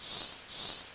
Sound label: magic knife charge idle; Knives; cstrike; MiraclePrism